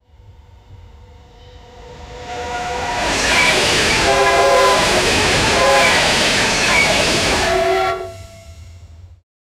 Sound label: french steam train at ful, trains